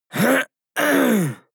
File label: harrumph male mouth open; soundpool